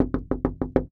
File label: Household Wood Door Knock Normal